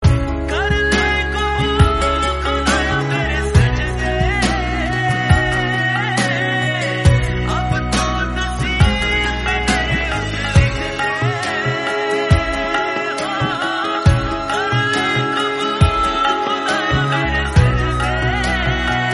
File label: prayer to god hindi